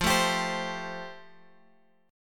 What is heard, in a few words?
F Major Add 9th, Guitar Open G